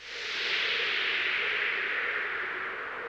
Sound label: Bell Board